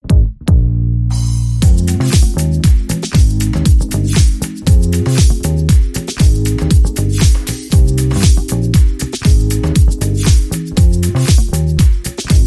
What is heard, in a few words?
Playing On My Flute